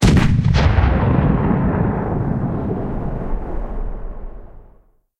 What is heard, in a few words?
cannon 84 94 tail far